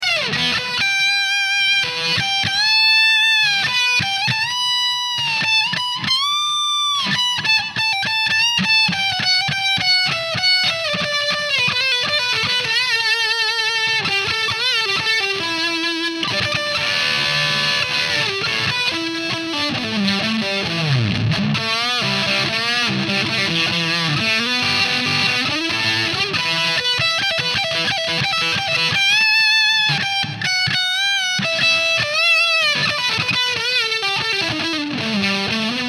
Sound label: Solo, Power boost with buffer in front